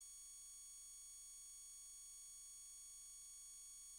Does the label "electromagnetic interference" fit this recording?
Yes